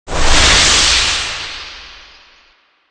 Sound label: pistol shoot; weapons